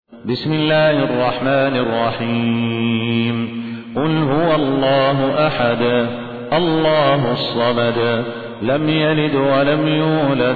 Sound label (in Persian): ترتیل; صالح الصاهود; سوره اخلاص